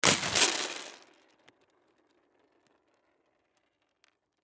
Noodles Open Package Spill Roll; Food; Household; Blastwave; Foley

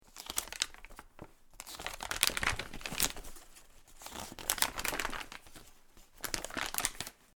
Magazine Turn Pages Briskly; Grabbing each page forcefully and turning it over; Crinkling Sound Effects; LynneMusic

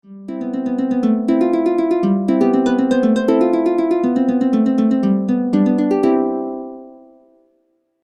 Melodic Harp Background Loop; Royalty Free String Loops; Andrew Fleming

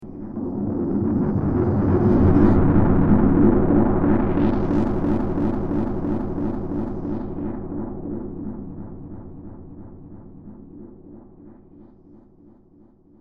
Seismic Swell; science fiction sound effect

